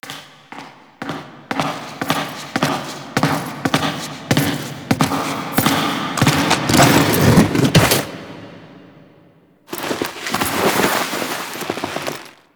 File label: Foley Enter Steps Briefcase; Parvos Lich Intro; Cinematics